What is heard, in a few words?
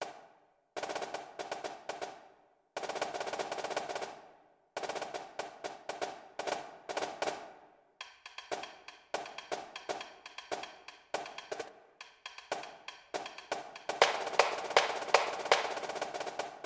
snare audition